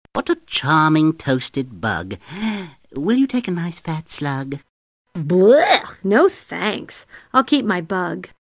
bug

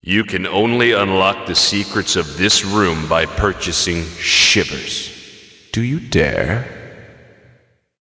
Unlock; Shivers

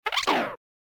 Menu sfx unused

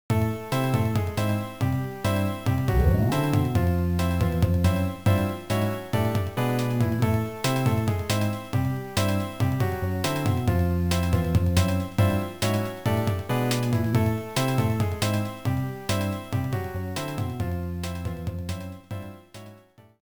BGM END; WarioWare Inc; DraBuru

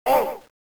bomb step on; WarioWare Inc; Mario2

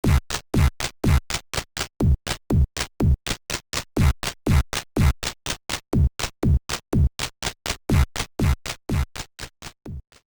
Pause theme; Battletoads NES (US-EU)

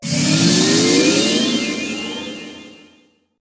rank up; Bej Classic

